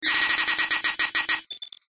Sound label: science fiction machine glitch